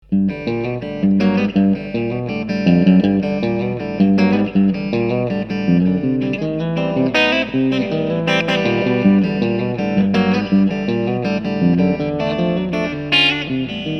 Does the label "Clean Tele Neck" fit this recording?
Yes